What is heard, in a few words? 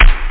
bdrum; turrican